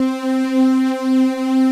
STR HIT; Pro-Rec - Synthsonic

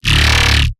mini grungy, bass

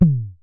Synare Filter; Star Instruments Synare 3

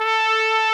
trumpet A3; Greytsounds VOL-1

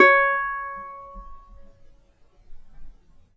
DB4 HARP; USB Soundscan vol.62; AKAI

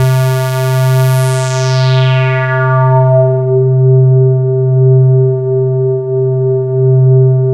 LUCKY LEAD, KURZWEIL K2000

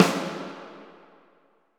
SNR SONAR, Orchestral Perc; Roland LCDP03

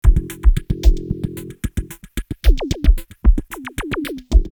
In D, 112; X-Static Goldmine

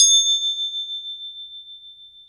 Crotales, Orchestral Perc; Roland LCDP03 Orchestral Perc

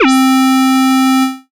syn, Dance Synths, Acid Bubble; ProSamples vol.37; Best Service

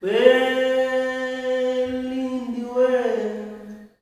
"WEN-LINDI", MALE; Spectrasonics; Heart of Africa 2; S.MALEAMB

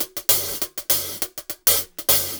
100, Top Ends, Beats; Zero-G; Monster Beats

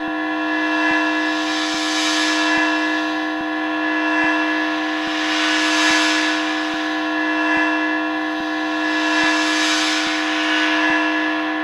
METAL SW; Spectrasonic Distorted Reality 2

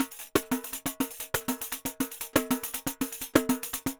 Pandeiro Samba 120 bpm; Best Service; Festa Latina VOL-1

